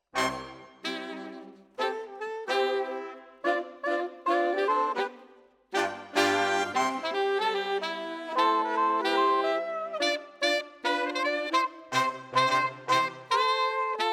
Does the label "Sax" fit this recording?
Yes